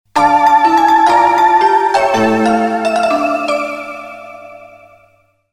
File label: Murka